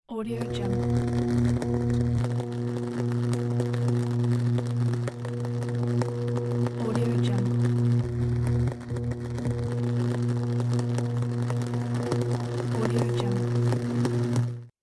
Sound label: electricity; erhanaarassfx